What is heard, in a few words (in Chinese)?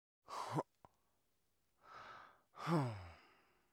叹息(女人)